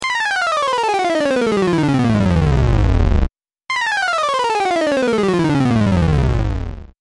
game loser

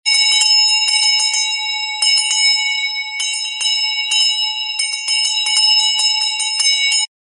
bell brass hand rings

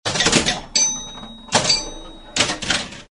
bell pinball vintage short play

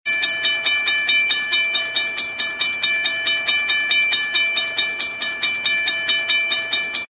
bells bell railroad fast crossing ring

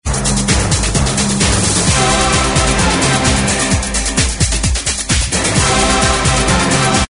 hallelujah groove

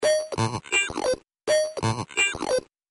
bleep and glitches

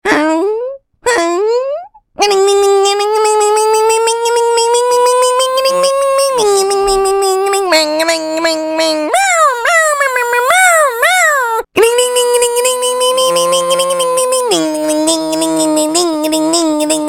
froggy fx dry

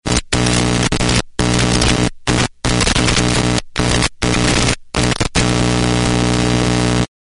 comm static heavy buzz

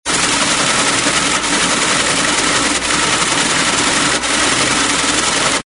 teletype report printout

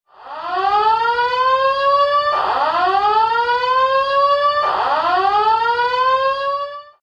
Main Frame Computer Alarm

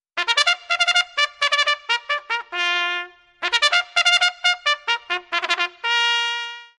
Bugle Call First Call Alarm

